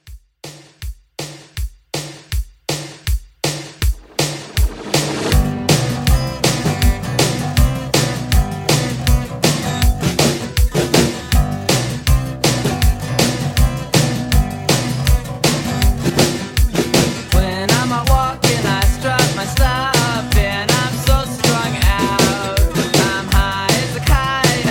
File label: 80s Rock Quick Re Drum 160